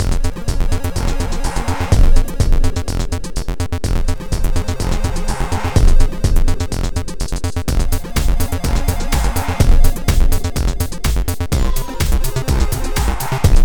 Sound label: PSG Digidrums 100Hz 125bpm; Bonus Bubble Twist